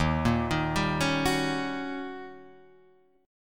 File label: Eb 7th Sharp 9th, Eb, Guitar, Drop D; Eb7#9 arpeggiated; 1,1,1,0,2,2; JGuitar